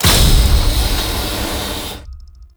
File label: Blast Door Close; Ambience; Warframe; TheNewWar; Zariman